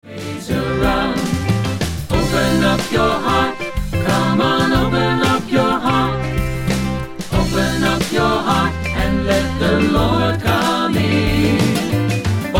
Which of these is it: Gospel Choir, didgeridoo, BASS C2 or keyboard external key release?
Gospel Choir